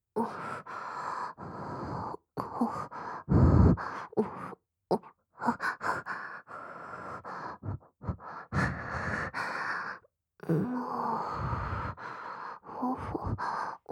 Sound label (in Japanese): オホ声 吐息多め